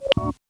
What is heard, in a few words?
vodafone tone